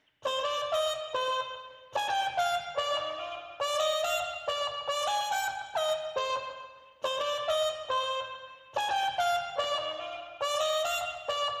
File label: Trumpet Clean